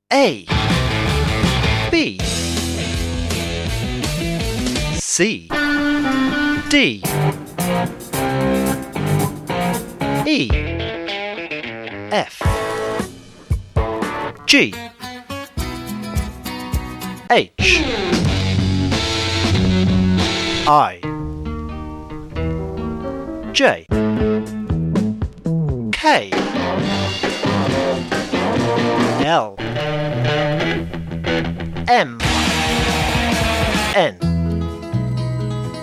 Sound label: a-z riffs